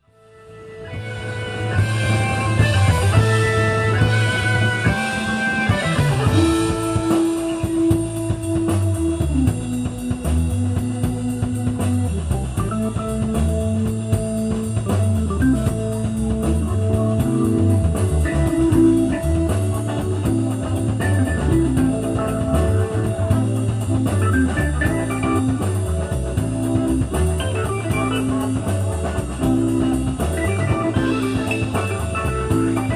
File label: Solo